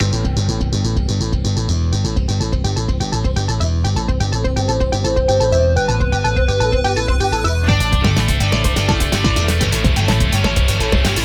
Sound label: music game; spider-man-mysterio-rush-game; manhattan